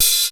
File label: BIG HH, DRUMS; 90 sSampleCDs; Crypton - DrumBase5000